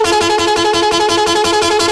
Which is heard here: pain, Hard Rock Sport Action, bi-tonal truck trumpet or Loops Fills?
bi-tonal truck trumpet